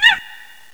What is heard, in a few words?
Die Bee; Monkey Shines 1.2.1; Fantasoft